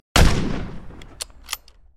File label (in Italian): Pistola